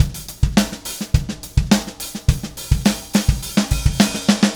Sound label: Beat Fill; Sonic Foundry (Sony Creative Software); Indie Rock Anthems; Session 02 - Clinical